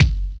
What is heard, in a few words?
HI RIDER; Spectrasonics; Metamorphosis